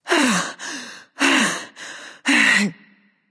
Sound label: nv breath; smite; awilix